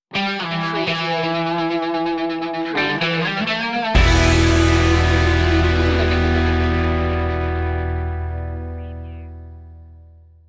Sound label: dirt stinger